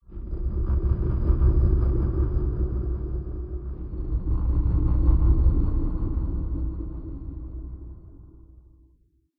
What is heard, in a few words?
Ambiance Undercave Pulsation